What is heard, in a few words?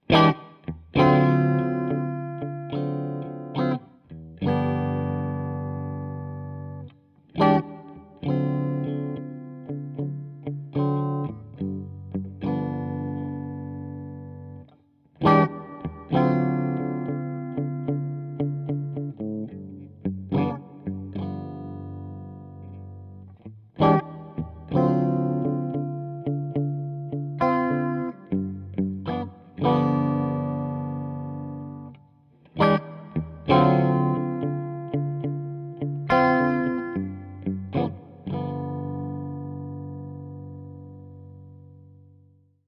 hall modes, reverb; tc electronic nova